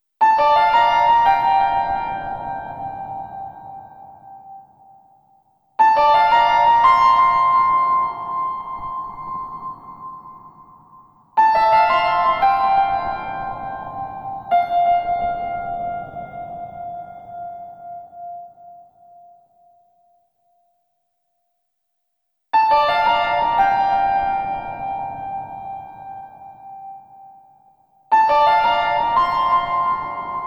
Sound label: piano